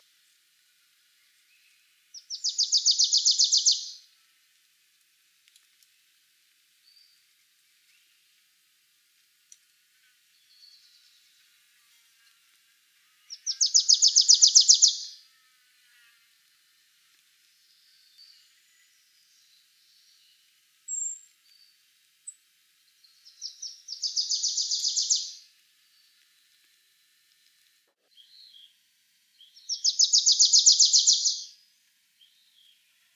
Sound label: Wilsons Warbler Cardellina pusilla